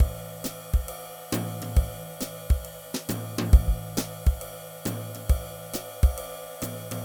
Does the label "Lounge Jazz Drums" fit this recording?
Yes